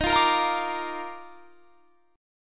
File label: Eb Major 7th Suspended 2nd Eb; EbM7sus2; strummed